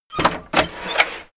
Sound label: open dooring door